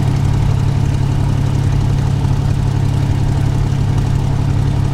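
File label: mustang2 cockpit right, engines; War Thunder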